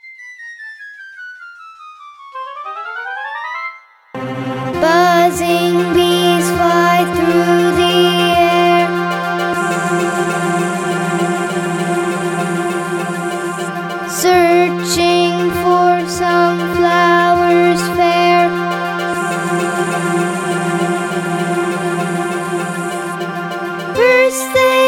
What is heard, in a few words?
Buzzing Bees